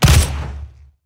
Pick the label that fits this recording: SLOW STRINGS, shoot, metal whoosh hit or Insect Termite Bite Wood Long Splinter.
shoot